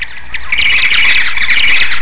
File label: bats